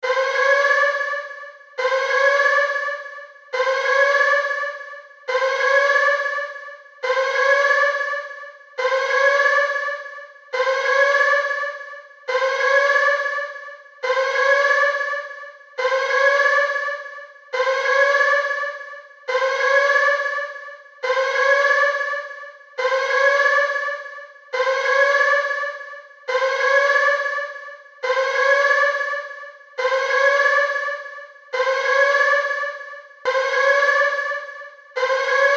ambient alert sound

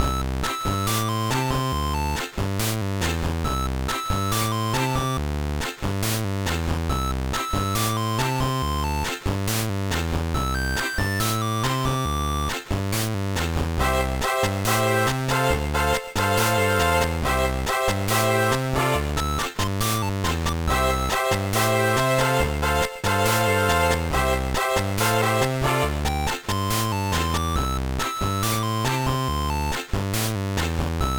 BGM; zen nihon shounen soccer taikai 2 mezase nihon ichi; gba

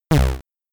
Obstacle Crash; Metro Cross arcade

